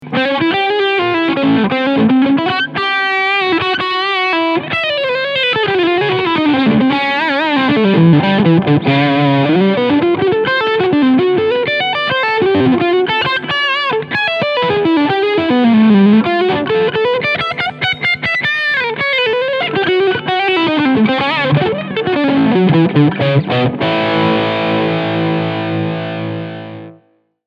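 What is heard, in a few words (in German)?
FLATV1 BK Overdrive Steg Pickup; Ibanez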